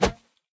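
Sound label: sweeping short swing of a machete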